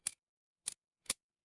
mechanical equip

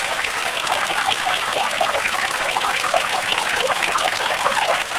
water stream

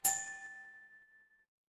feedback checkpoint

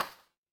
ui click

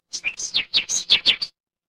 animal bird

